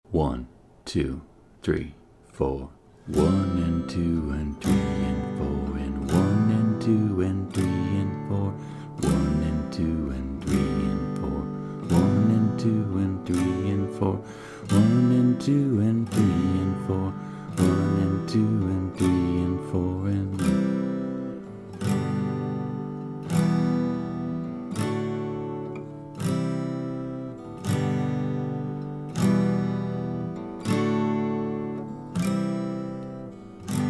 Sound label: four chords basic